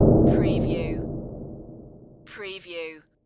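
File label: Production Element Hit Under, underwater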